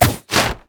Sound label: item sightward banner; LoL